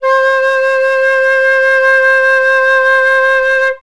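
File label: flutes C4; Best Service; Peter Siedlaczek's Advanced Orchestra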